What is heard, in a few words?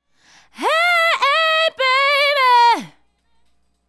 Female Solo, Hip-Hop and RnB Vocals